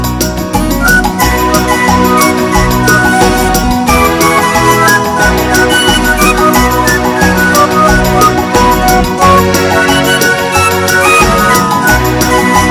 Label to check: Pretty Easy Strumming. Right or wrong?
Right